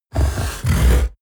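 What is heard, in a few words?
coloss snort deep short, creatures; kreaturen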